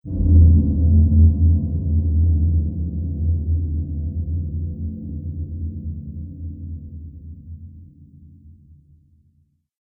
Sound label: Sci-fi Horror Reverb Hit; Sf Horror - Reverb Hit; Sci Fi Sound Effects